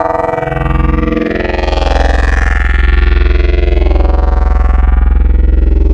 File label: Spectral - Ring Mod; Wavetables; Synthetic's Serum Collection; ESW Core Wavetables; Echo Sound Works Core Tables